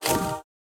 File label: attack cleric